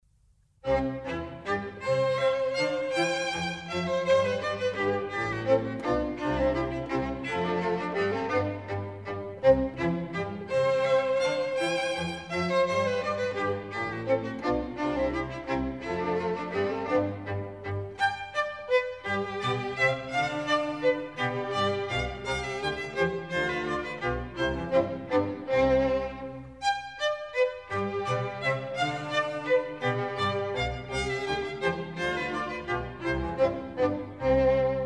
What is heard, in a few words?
Minuets, C Major; Mozart; Dances and Marches